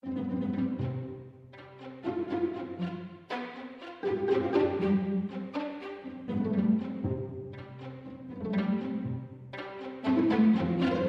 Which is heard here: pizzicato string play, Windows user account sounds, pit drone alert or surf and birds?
pizzicato string play